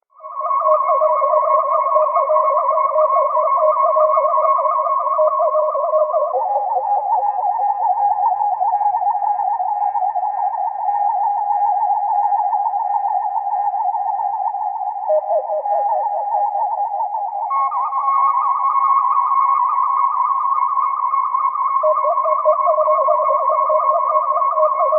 medical scanner; TOS

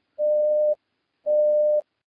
hailing, computer

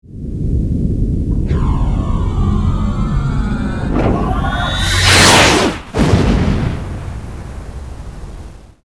warp

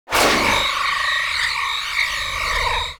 tractor beam; alien sounds; borg

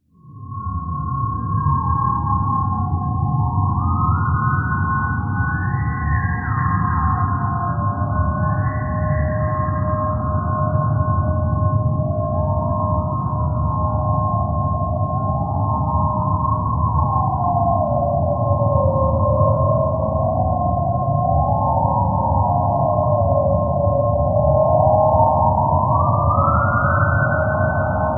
lab strange; TOS; mudd

